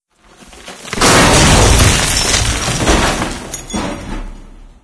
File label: timed debris fall